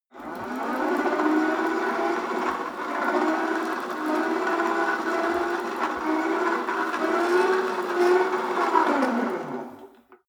rope trainer pull sequence slow long, fitnessstudio